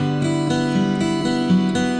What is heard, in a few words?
acoustic guitar picking